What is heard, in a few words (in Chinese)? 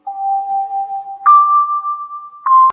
音乐铃; 报时; 报时430